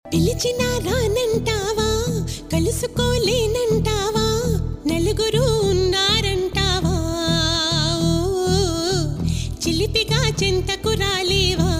Telugu Ringtone; Athadu

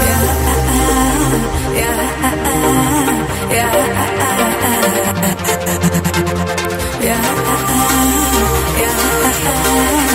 BG Tone Telugu Ringtone

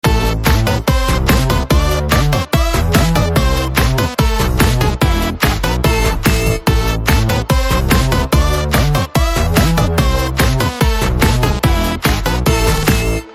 BGM Telugu Ringtone

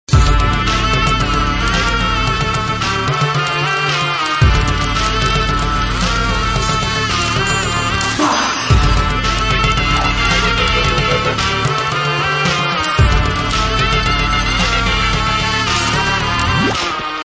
Teaser BG Tone Telugu Ringtone; Spyder

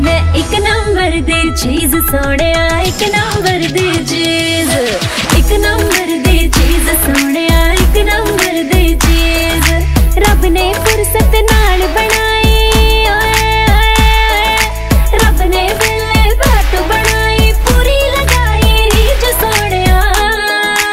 Punjabi Ringtone

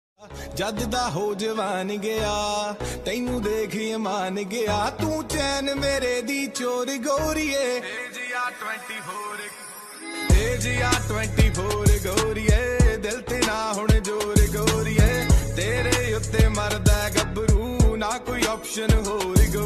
Popular Punjabi Ringtone

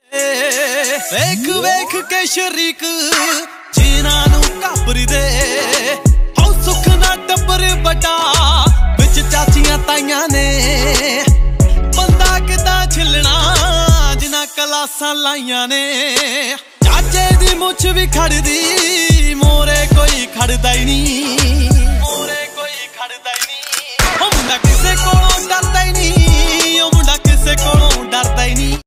Punjabi Beats Ringtone

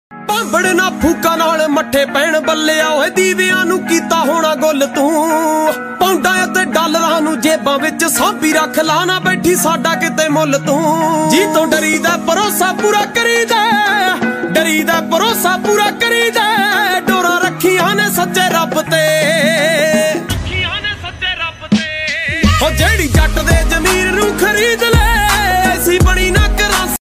Dance Punjabi Ringtone

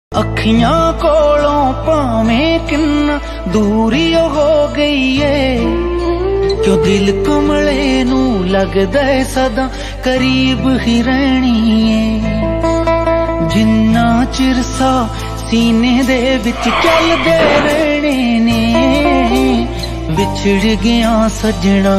Punjabi Ringtone

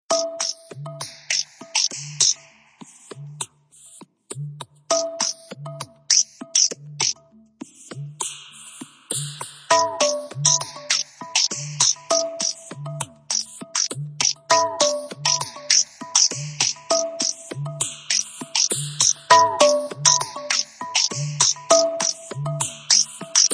Alarm Octa Ringtone

